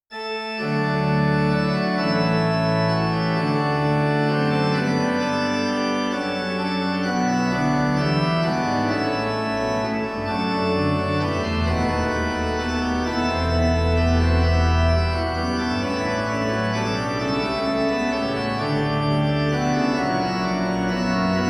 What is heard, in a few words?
Marche a trois temps, organ; Mostoles